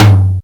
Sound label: raw tom drum one shot g key, Royality free tom drum single hit tuned to the G note; tom drum samples